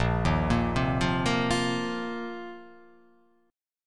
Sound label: A sharp Suspended 2nd Suspended 4th down